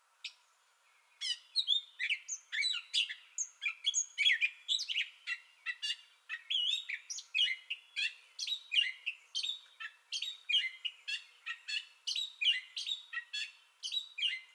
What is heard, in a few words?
Gray Catbird Entire Song Bout